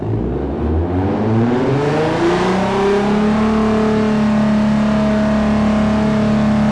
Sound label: rev, vehicles; gtr; TDMCarpack; TDMCars